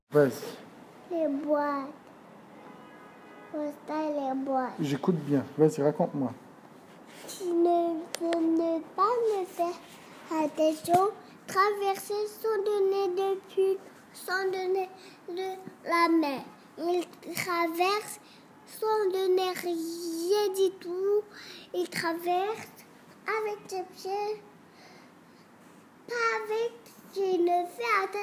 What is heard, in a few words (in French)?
voiture creme glacee